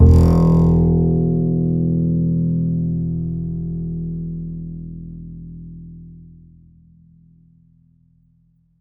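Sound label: double bass E; Univers Sons; Jazzistic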